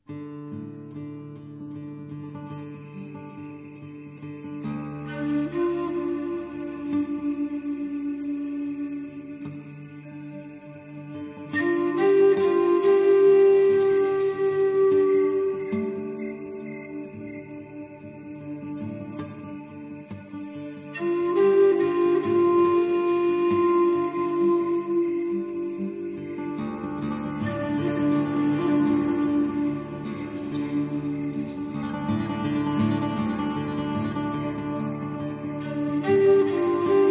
Crickets, Native American Flute for Massage Meditation & Healing